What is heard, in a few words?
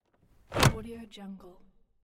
glovebox